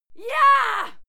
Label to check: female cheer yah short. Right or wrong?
Right